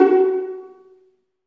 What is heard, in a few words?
String Vlns Pizz